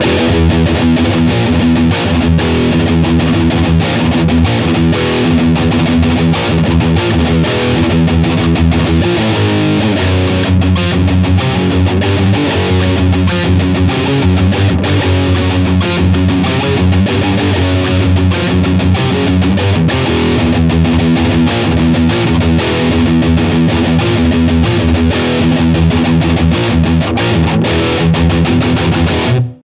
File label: Metal